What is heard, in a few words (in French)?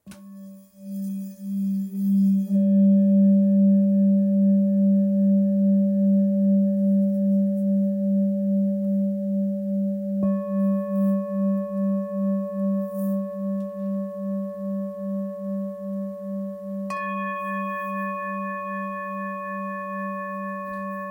Bol bengale sol